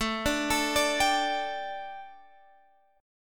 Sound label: A 7th Suspended 4th, chord